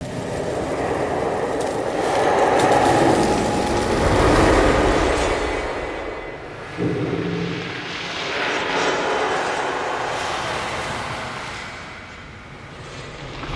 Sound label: Hub Ambience Loop; Under City; Champion